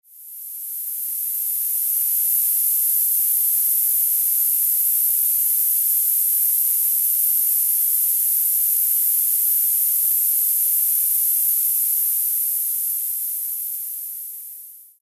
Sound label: Tinnitus Drifter 12.5 kHz to 13.5 kHz Noise Masking